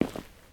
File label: Footsteps, Human, Tile, Walk; Backrooms 2D